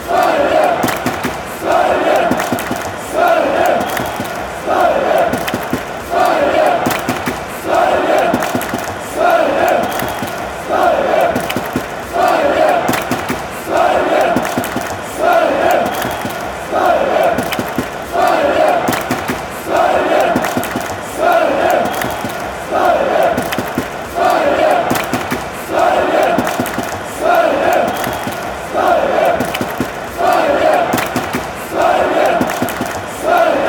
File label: claps; sweden